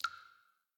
Cave Random Drips; The Inn

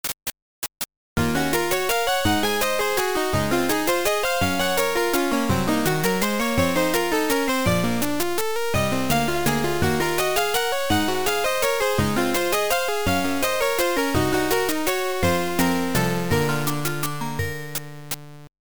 Leaving (Long); Download 2; TurboGrafx-16

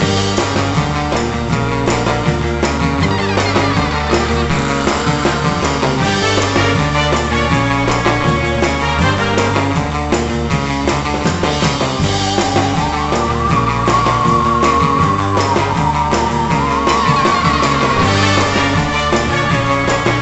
Music Spirit Drums; Monster Lab; NDS

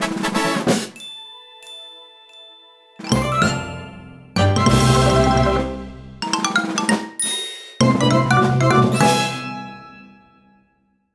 Fanfares

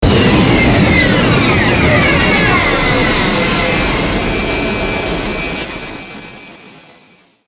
Powerup Collector

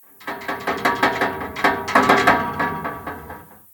Metal Bars Random; The Town

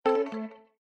Hardware Remove; Windows 7

